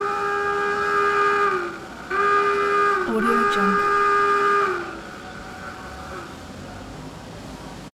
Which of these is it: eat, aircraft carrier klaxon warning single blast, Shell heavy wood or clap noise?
aircraft carrier klaxon warning single blast